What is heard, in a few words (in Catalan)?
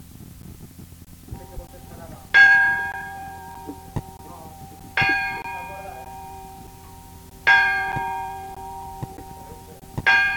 campana